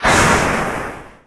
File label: Steam Burst